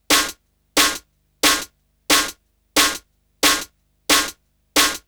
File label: Snare Loop